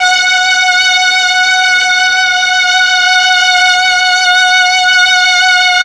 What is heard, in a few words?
MEL STR, Legendary M400; ILIO; Propeller Island